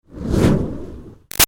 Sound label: Fire Torch Whoosh Med; Flame Sound Effects; Objects